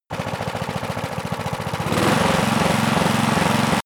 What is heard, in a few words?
engine small idle accelerate